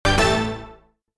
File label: Question Jingle